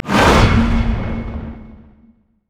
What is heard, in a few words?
wall of pain; lich; karthus